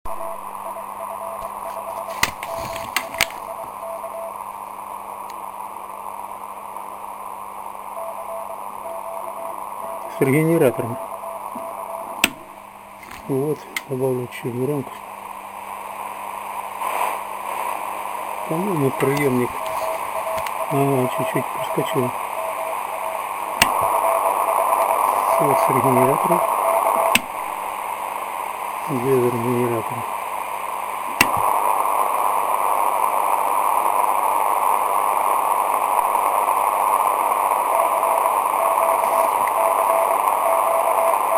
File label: REGENERATOR R326M